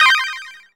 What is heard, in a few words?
chords, Synth